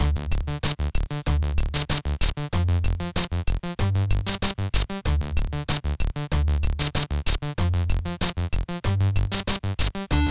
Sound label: beat